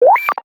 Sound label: swanny, notifications; Nothing Phone (2)